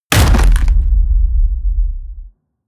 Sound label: hit wood, hits impacts